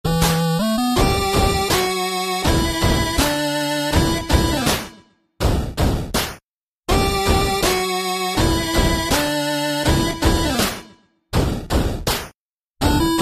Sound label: 8bit